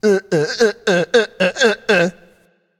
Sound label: tongue; smite; voicepack; khepri